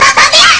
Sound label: pump; ST-XX Amiga Soundtracker Sample Packs